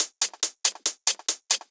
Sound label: Loops 140 BPM Hihat Percussion Loops